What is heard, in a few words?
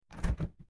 Refrigerator open